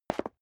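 step leather shoes concrete single stair down, footsteps; schritte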